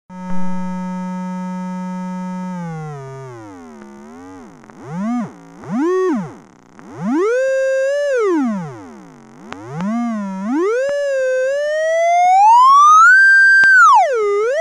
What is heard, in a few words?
VCO WAVETABLE